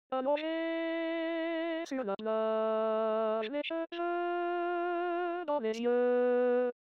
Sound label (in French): alto